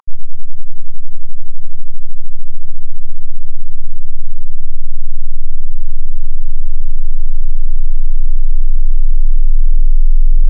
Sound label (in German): sinus sweep